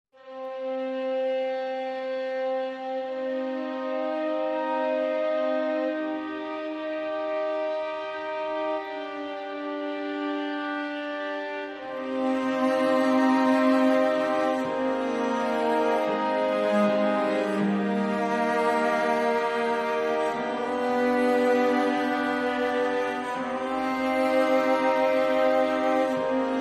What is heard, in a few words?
Quartet